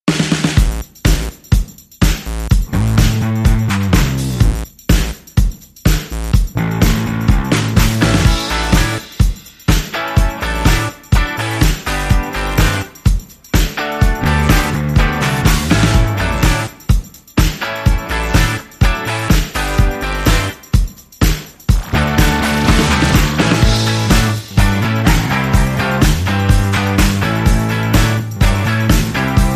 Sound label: No Synth Bass Lead Synth Organ and Bridge Vocals